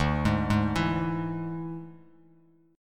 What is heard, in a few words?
D Suspended 2nd Flat 5th D Bass Standard; 10,11,6,9; arpeggiated